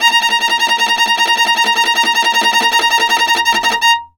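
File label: viola tremolo A#3; Orchestral; DirectWave; Varazdin Orchestral; Viola II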